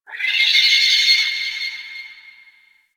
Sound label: Night Distant Creature Small; Ambience; One Offs; Warframe; Eidolon Plains